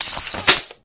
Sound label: beep; combat